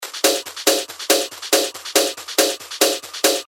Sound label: 140 BPM, techno loops; Girlboss; Revealed Techno Top Loops Vol. 9